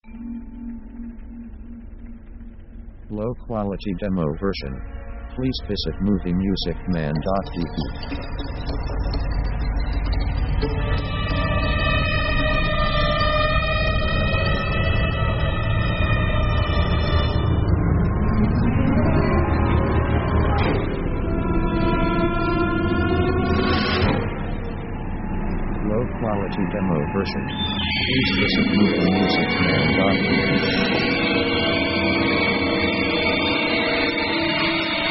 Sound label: orchestra horror theme; Dark mood